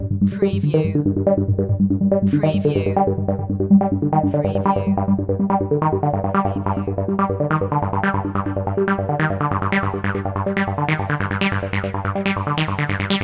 Techno-Trance Synth Arpeggio Loop JX8P in G 142 BPM